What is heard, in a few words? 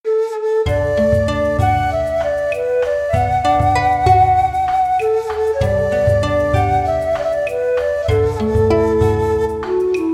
Japanese style music with impressive koto and shakuhachi